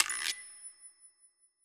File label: message irc event; stereo